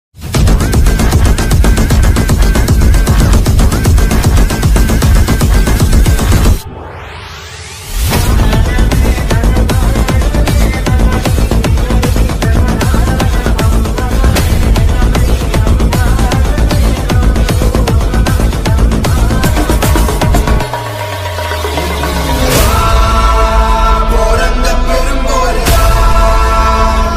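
trailer background music ringtone background music dj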